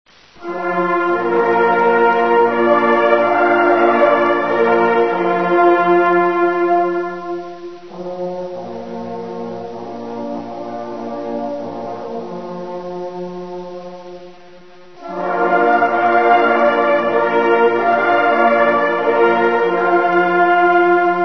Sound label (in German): schwarzwaldglocken